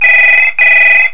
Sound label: ring